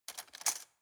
cutlery plastic drawer grab

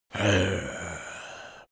zombie moan idle

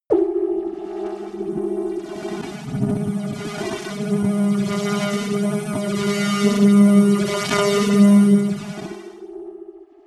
creepy modulator long

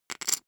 poker chip stack small rattle

